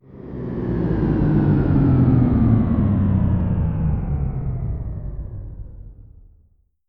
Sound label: Spaceship landing